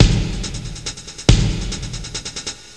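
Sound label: drum loop; Descent II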